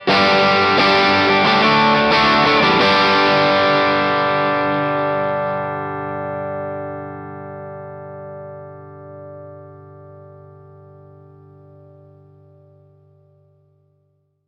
bypass; maxon cp101

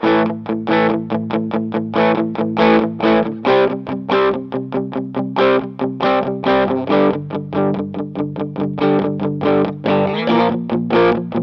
strat bridge

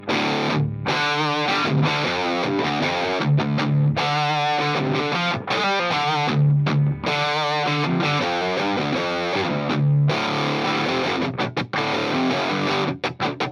lp snapper

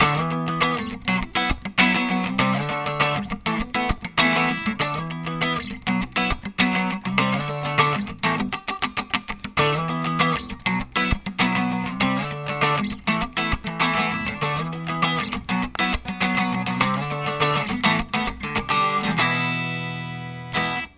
unplugged; tele

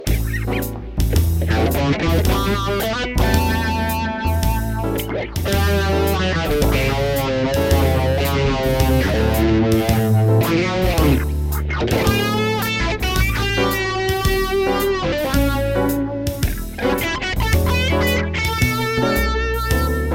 strat bridge lead, phaser; coron phaser55

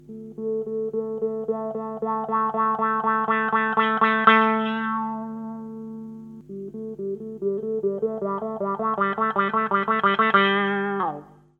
strato talla resonant

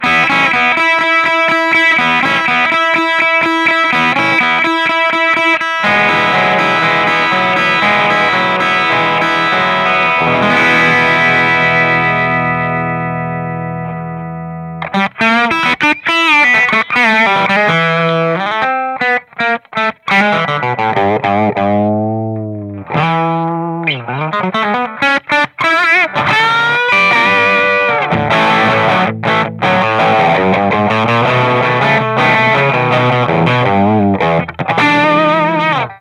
cabinet; bandmaster

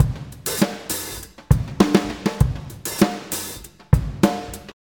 Drumbeat

